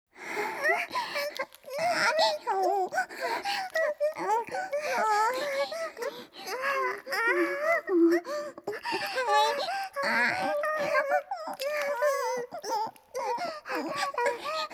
嬌声 ループ

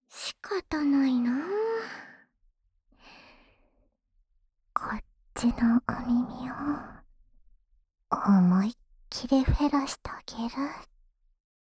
ツンデレ 喉奥左耳フェラ; フェラ素材

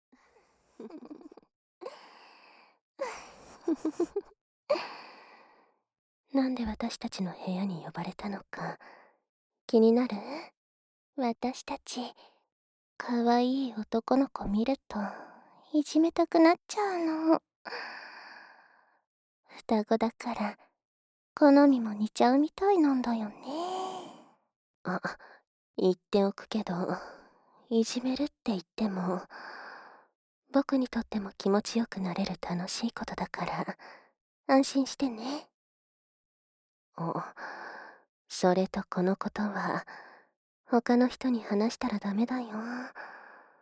耳舐め; 超密着♡W耳舐めテコキでぴゅっぴゅ♡

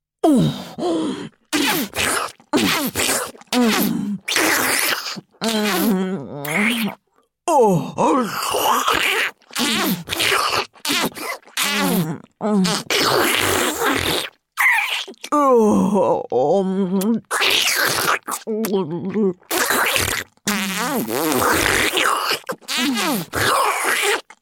下品オホ声フェラ; メインキャラ

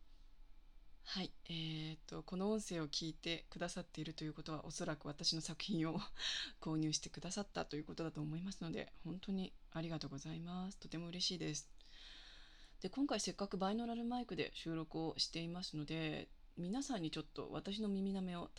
耳舐めASMR; 購入者特典耳舐めASMR

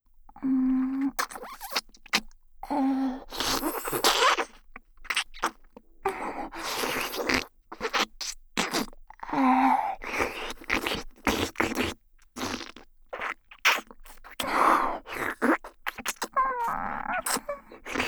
誘淫ベロチューループトラック, ASMR

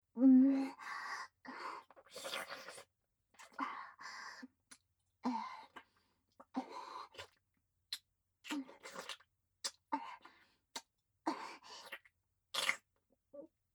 フェラ; アイリス

